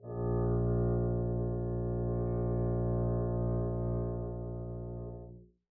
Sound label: arco bb1 p down, double bass; dsmolken